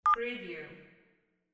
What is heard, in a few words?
cell phone